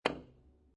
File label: Billiard Pool Snooker Hit Billiard Ball With Billiard Cue; Pool Sound Effects